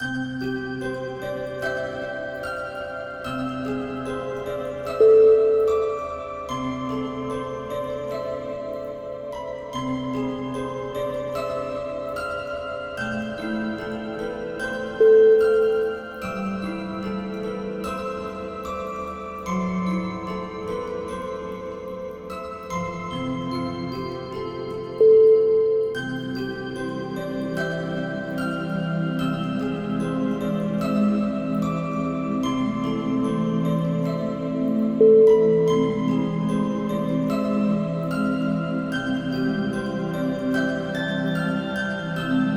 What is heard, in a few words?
lullaby